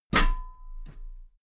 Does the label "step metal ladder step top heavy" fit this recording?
Yes